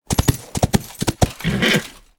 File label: Armored Warhorse